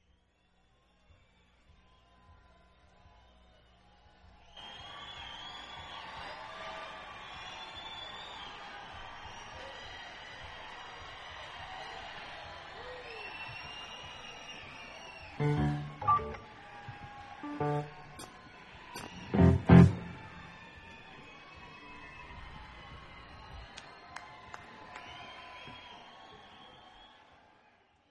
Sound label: jazz